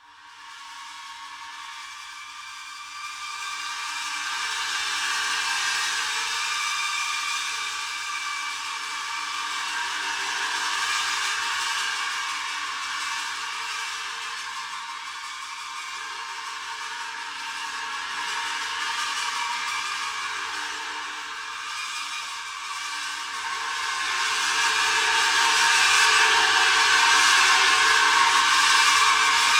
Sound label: Mine Tension; Outlast 2; PS4 Windows Xbox One